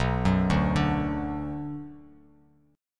B Minor B Bass Standard; arpeggiated; JGuitar

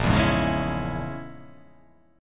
Bb Minor 7th Flat 5th Bb, Bbm7b5 chord; Bbm7b5 strummed

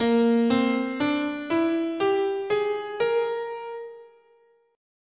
A sharp Prometheus, scale

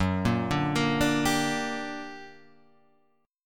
G Minor Major 7th, Gb, guitar chord

